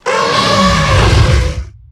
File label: Attack